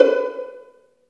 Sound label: String Vlns Pizz